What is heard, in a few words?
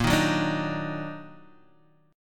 Eb Minor Major 7th Bb, chord